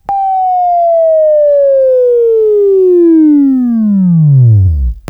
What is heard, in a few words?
Buzz; Labview-Program; Sweeping Training program